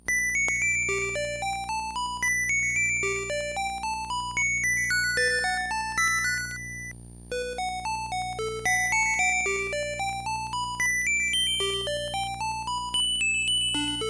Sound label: Game Over